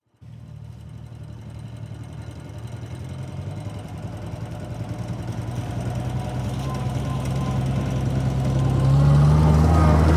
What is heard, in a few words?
Jeep Backs Up On Dirt Road; Rattly 1980s Jeep Backs Up Down Dirt Road; Cars; Vehicles